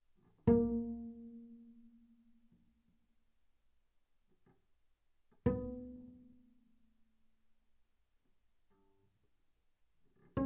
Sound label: Bass pizz sul G ff Bb3 Gb4, double bass